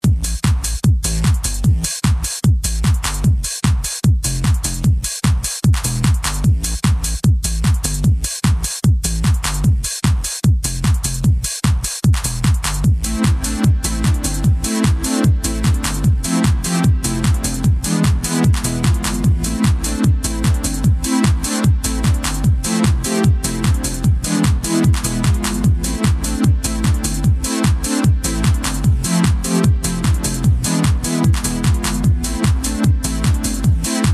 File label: Funky Beats, Night Electronics